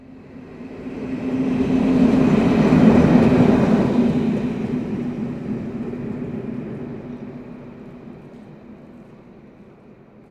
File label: train fast passing; best service Blue Box